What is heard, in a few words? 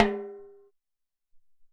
Tm Bal